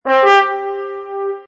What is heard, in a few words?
trumpet